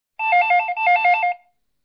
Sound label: ring